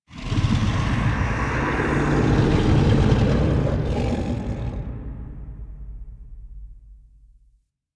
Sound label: Idle Agile Akimbo; Warframe; Voruna; Idles Agile